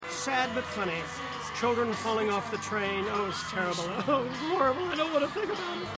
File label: sad but funny